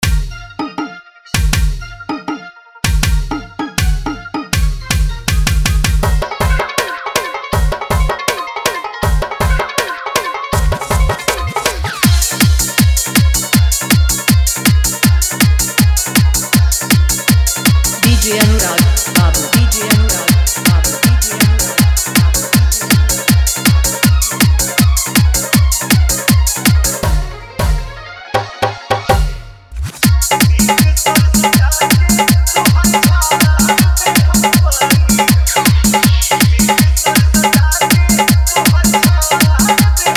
Dhollki Bass Dance Mix

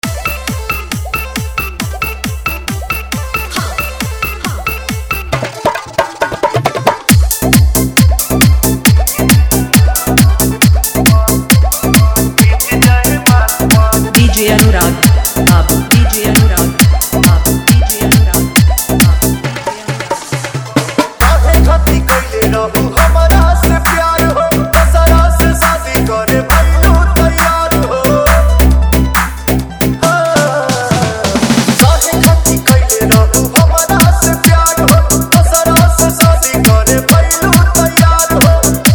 Bhojpuri Song Dj Mix

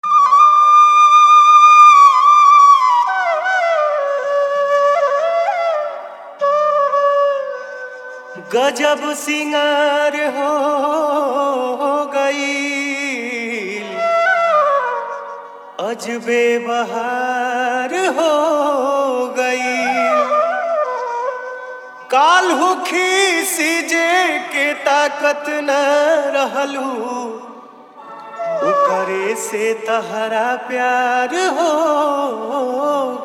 Dhollki Bass Mix

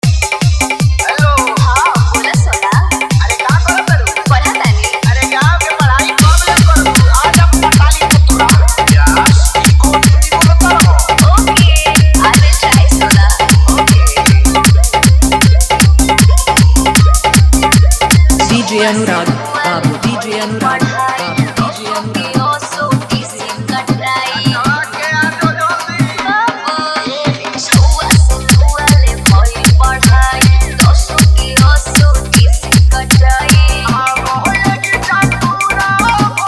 Dhollki Bass Dance Mix

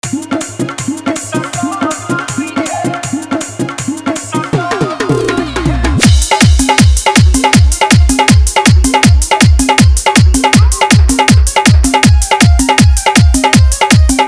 Bhojpuri Hard Dhollki Bass Dance Mix

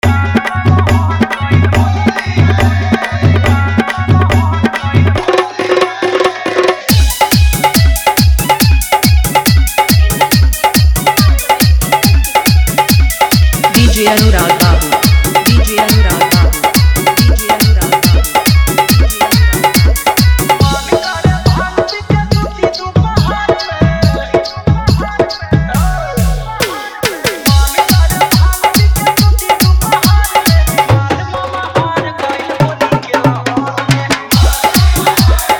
Holi Dhollki Bass Holi Dance Mix

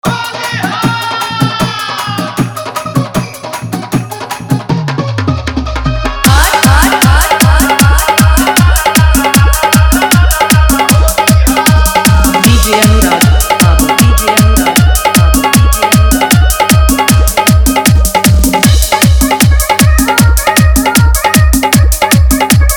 Dhollki Bass Dance Mix

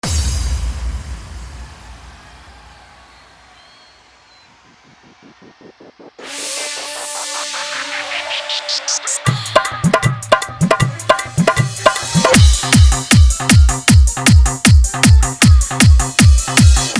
Dhollki Bass Dance Mix

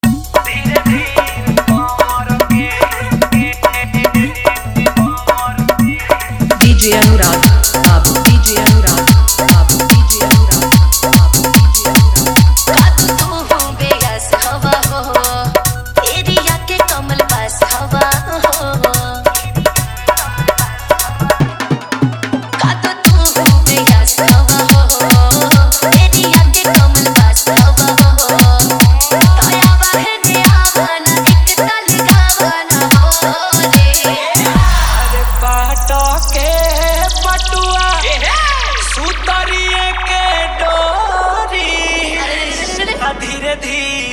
Dhollki Bass Mix

